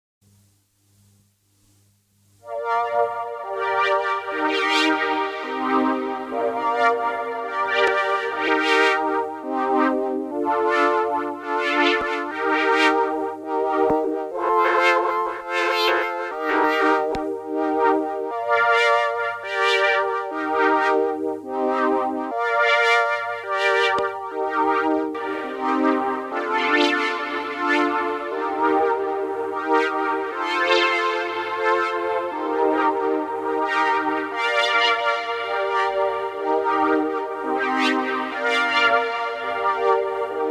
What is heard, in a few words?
Saw String Chorus